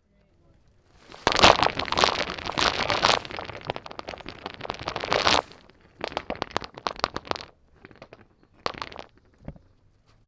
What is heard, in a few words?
Rock Slide